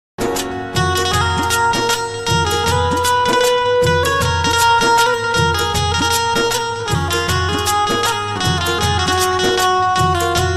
instrumental ringtone phone ringtone instrumental music